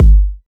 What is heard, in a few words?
fat steel kick drum g key